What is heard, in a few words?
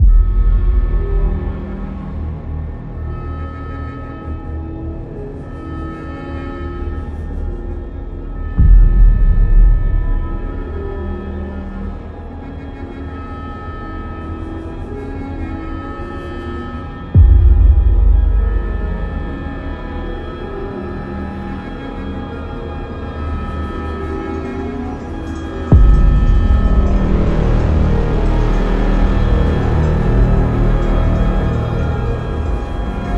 Building Tension